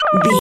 ui menu button error

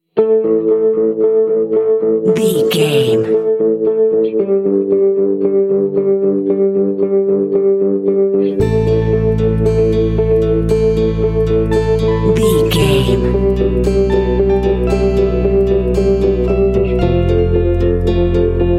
light tension concerned

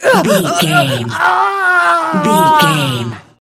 voice female death intense

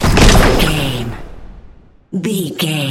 sci-fi explosion

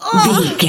voice female hurt pain mild

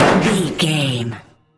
metal med impact

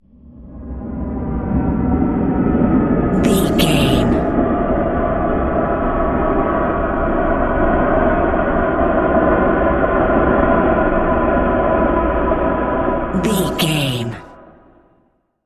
dark portal wind effect

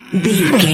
voice male effort action release

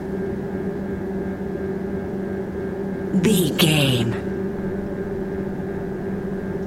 background hum engine motor ambience

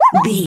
voice fun small character emote happy

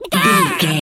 chicken cluck scream long

